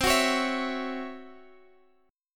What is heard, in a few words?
Db Minor Major 7th Double Flat 5th C